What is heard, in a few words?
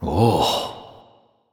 nv sigh; smite; xbalanque